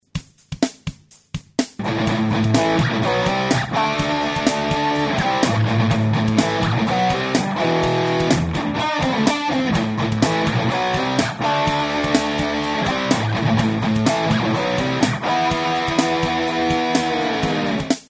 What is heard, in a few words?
matchless chieftain sm57 off axis, matchless chieftain sm57, riff dist